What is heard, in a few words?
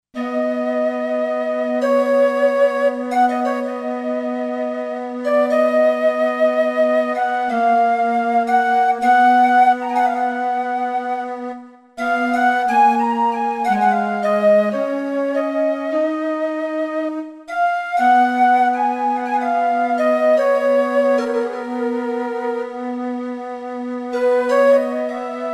3D Pipe, Roland SC-880; Factory Patches